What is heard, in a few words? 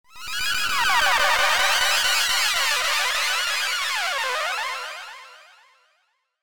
sound design pyschotic warble shrill; zapsplat